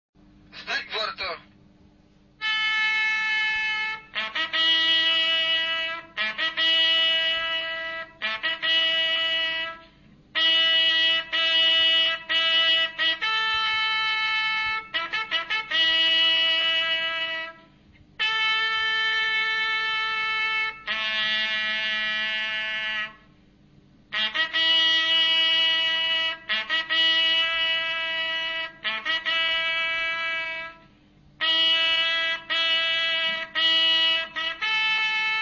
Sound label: horn